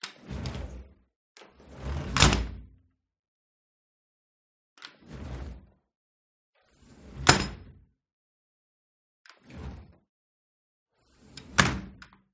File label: SLIDING DOOR Metal Living Room Open and Close Hard Close Perspective; gain walkers